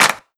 original clap single shot a key; one shot

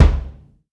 small reverb bass drum sample c key

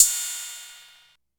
crash cymbal one shot a key; cymbal drum samples

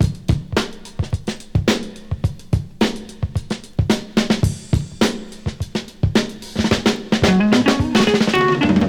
108 bpm drum groove g key; drum loop

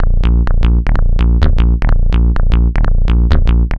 Techno Melodic Bass; instruments; loops

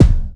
natural bass drum sound g sharp key

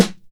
tight low end steel snare drum sample g key

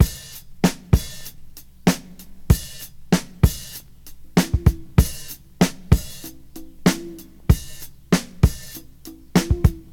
96 bpm drum loop c key

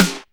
smooth snare one shot f sharp key

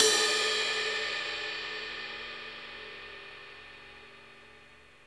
large room cymbal sound clip d sharp key

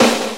old school warm sounding snare drum b key

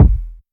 subtle reverb kick drum single shot e key

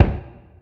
wet bass drum D key

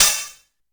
dampened hi hat one shot f sharp key; hat samples

